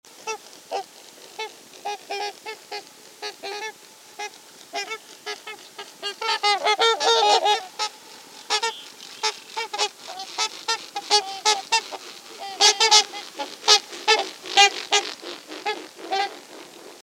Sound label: trumpeter swan; emiquon virtual tour; birdwatching; illinois